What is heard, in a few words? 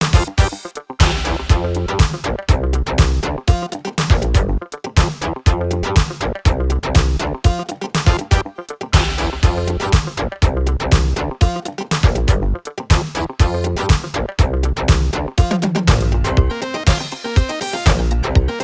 Layer 2 - 121 bpm